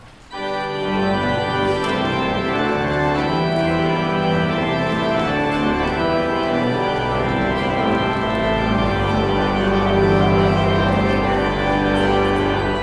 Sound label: Postlude